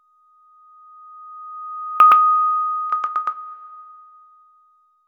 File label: Message